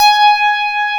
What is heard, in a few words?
FM A3, ELECTRIC PF, Piano; AKAI S6000 CD-ROM - Volume 1